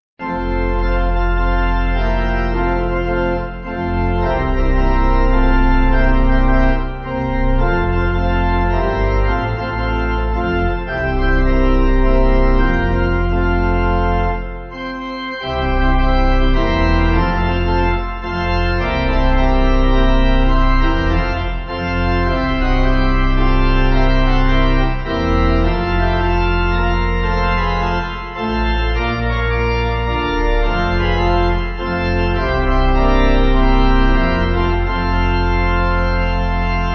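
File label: Pipe organ, church music backing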